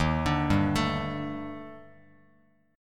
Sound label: D sharp Major Sharp 11th, Bass Standard; D#M#11 arpeggiated; JGuitar